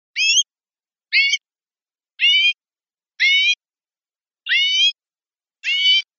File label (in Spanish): miedos verderon